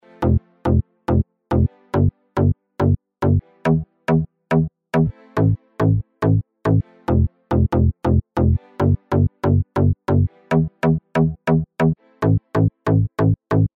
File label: Bass Dance